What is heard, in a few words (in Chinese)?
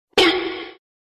咚-吓一跳